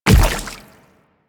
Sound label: Splitter Step Water, Footsteps; Warframe; Infested; Grey Strain